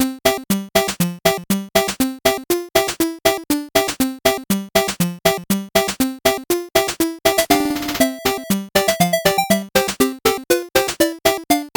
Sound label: 8bit bgm slow